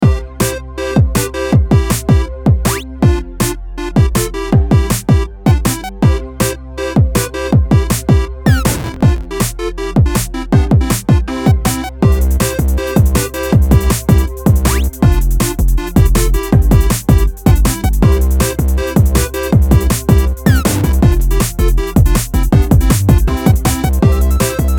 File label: bg music